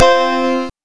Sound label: mac ii, boot sounds